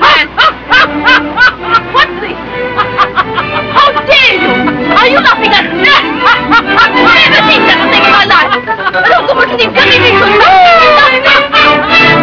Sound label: Last Laugh; sylvia scarlett